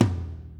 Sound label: tom, drum; Masterbits - Total Zone